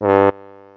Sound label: pipe, sound icons